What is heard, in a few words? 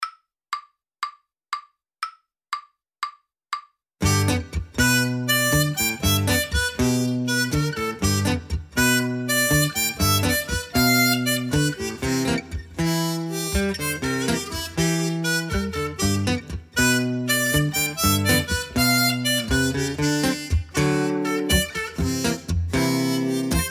Flea Rumba Blues